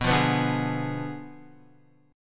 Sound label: B Diminished 7th B down Guitar; Bdim7 strummed